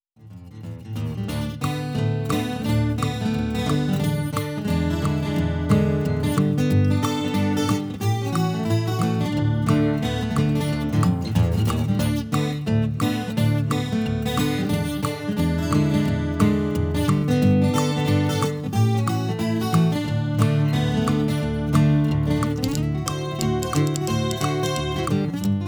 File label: Bonus Level; lucky luke; psx